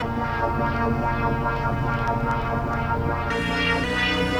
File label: Ambient; Sonic Foundry; Sony Creative Software; Stylus Pressure Urban Grooves on Digital Wax; Nu Tang